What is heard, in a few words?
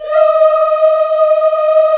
VOX, African Aahs, African Las